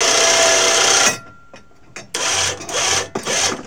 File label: HYDRAULIC, Industrial; SFX